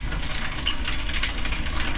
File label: bicycle; AKAI S-Series CD-ROM Sound Library VOL-6